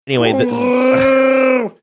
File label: attempting wookie sound